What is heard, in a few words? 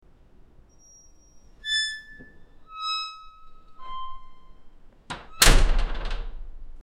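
the creaking of heavy metal; beeping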